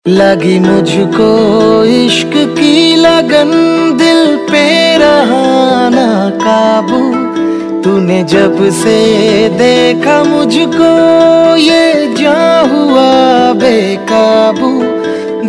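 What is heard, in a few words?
Ringtone, Bollywood Punjabi Pop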